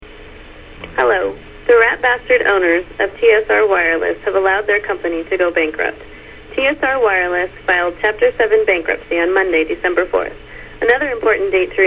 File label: pager